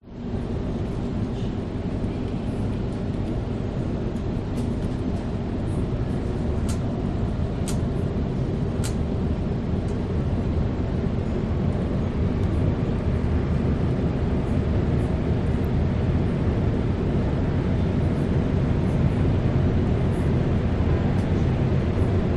vehicles train metro interior ride few people distant chat, Sydney, Australia